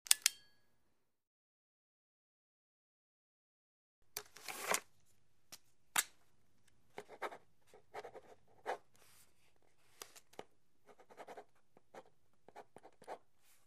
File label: Ballpoint pen automatic, press the button, write